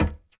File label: clank, player steps; quake2